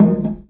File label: Loop, Chamberlin Rhythmate